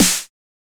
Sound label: 808 & 909 Kit SNARE; Roland Juno-D